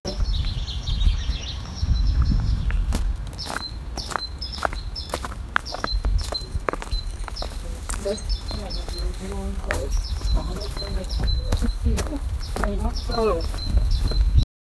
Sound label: women is walking around